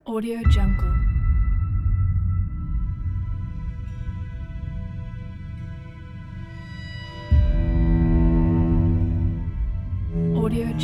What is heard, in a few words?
tense horror trailer